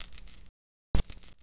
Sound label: Resynth Glass Hit